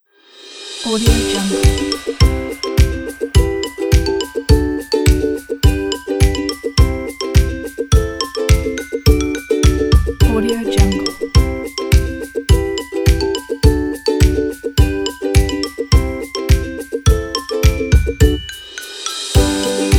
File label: happy dance